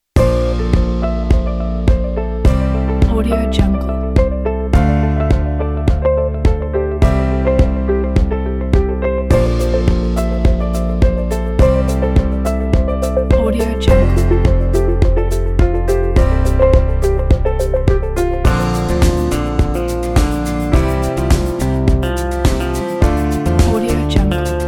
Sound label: dream corporate background